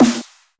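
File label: snare with bass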